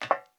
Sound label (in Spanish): Zapato tacon escalera metal